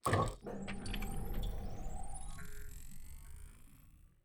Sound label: intro continue; spinsane; netent